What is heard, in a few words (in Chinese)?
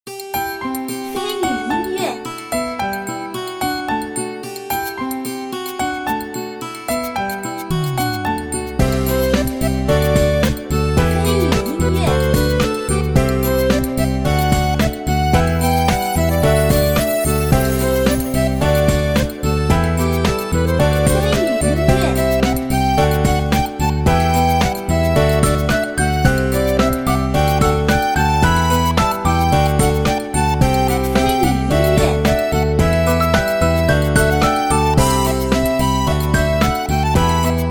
城镇-律动-轻松